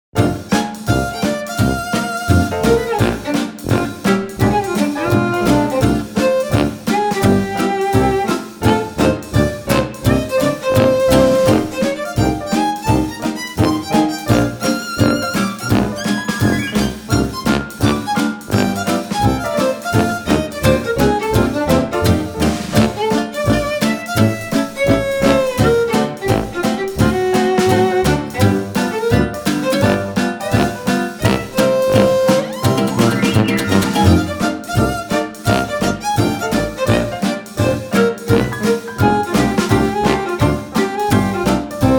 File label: Boardwalk Bounce